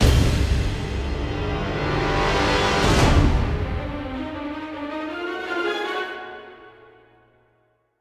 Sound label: MUSIC STING; LVL 11 NEUVACITY B; Lego Marvel Super Heroes 2; PS4